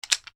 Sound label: toy bricks wooden movement; Miscellaneous Toy; Toys